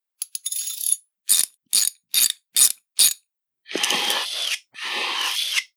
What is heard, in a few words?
Repair Loop